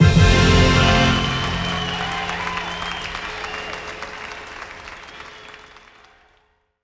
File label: BELL HAPPY; Gnosia